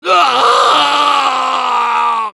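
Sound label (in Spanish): Soldier pain critical death, es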